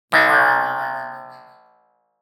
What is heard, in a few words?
Impact Comic Spring Hit - Metal, Going Down; Hollywood Edge; sound designer's toolkit v2